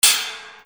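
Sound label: percussion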